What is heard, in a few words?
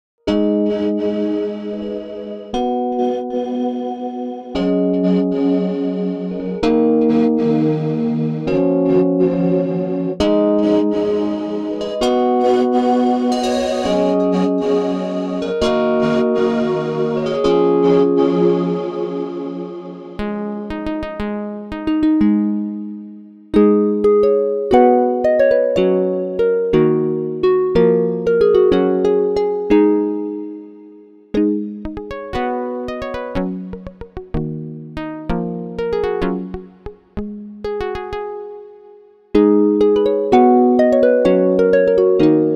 Pedal Harp; Peter M. Mahr is a musician and synthesizer enthusiast from Austria living in Switzerland.